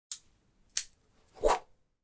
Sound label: switch blade knife open